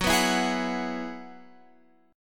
Gb 7th Suspended 2nd Suspended 4th, Guitar, Open F; Gb7sus2sus4 strummed